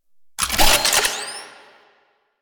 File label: Shotgun Quick Reload; Weapons; Warframe; Pax Duviricus